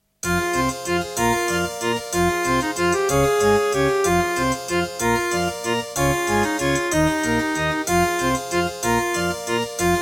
Serdaco Dreamblaster S1; Death Gate - Track 6

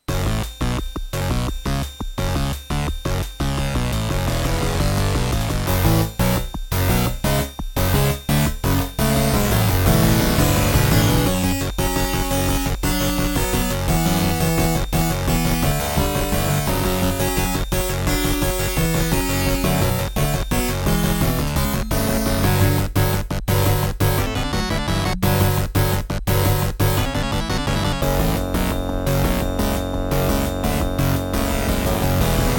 Intro, Creative SB16; Duke Nukem 3D

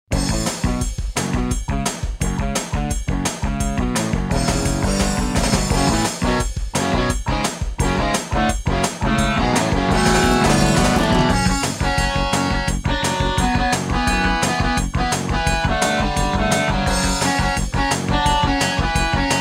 Intro, Creative Wave Blaster; Duke Nukem 3D

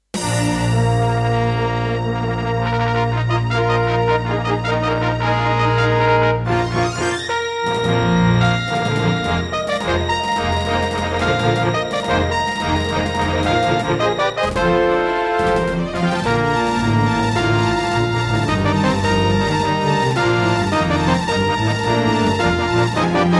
Intro, Roland SC-88 Pro 55MAP; Dark Forces

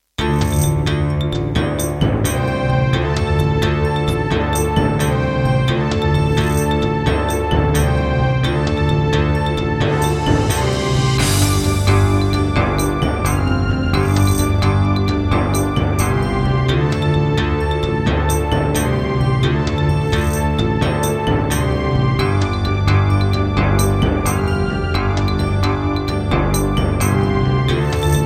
Roland SC-88 Pro 55 MAP, wavetable; Heretic

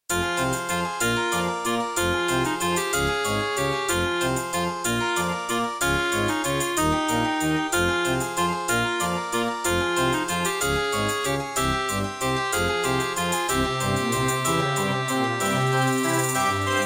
Track 6, Yamaha QY70; Death Gate

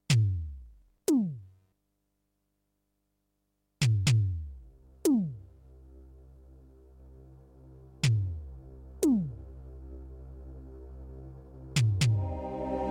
Terratec WaveSystem SWC001-2; Doom

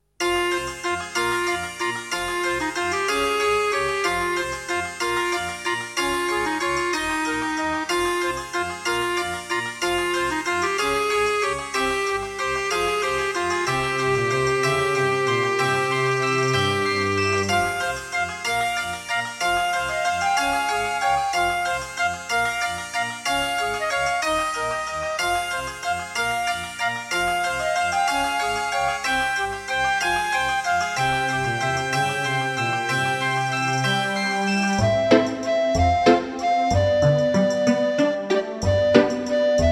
Track 6, Creative Sound Blaster AWE64 Gold; Death Gate

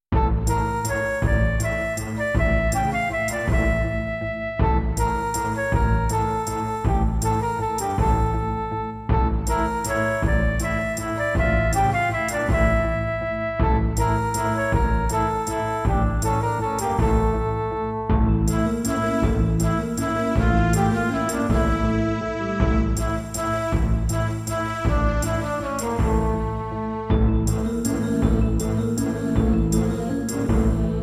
Kawai GMega

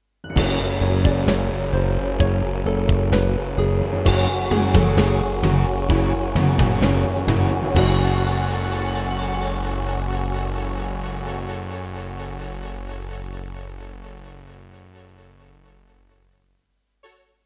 Roland SC-88 Pro 55MAP; Doom (Sigil); Introduction

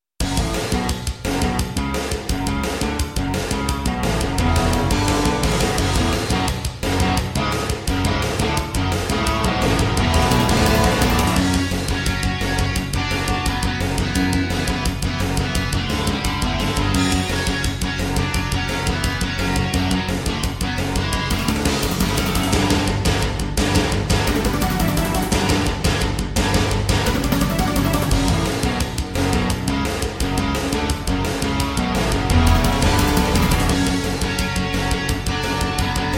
Roland Edirol SD-20 GM Contemporary; Duke Nukem 3D

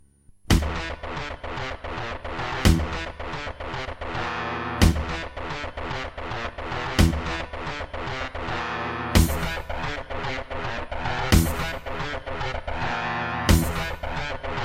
Serdaco E-Wave; Doom

